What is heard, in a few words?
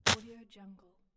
organic horror interface fleshy button